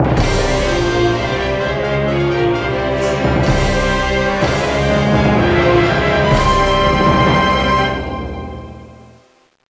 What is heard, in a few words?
Fanfare; Yu-Gi-Oh! Nightmare Troubadour; DS